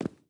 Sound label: refined concrete, walking; factorio 2.0.55